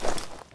draw, weapons

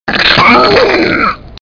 beast hurt; kz-endo slide svn pyramid

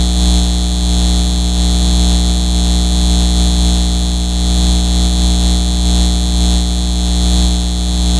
neon lights; kzra wired

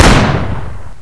pulse rifle, weapons

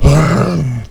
zombie pain

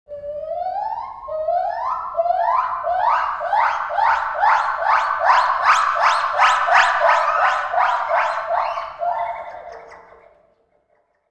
jungle call

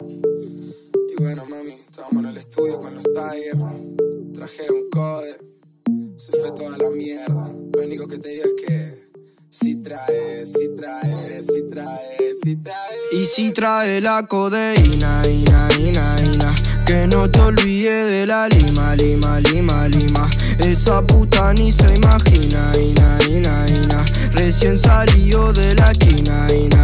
music

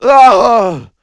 pain, human

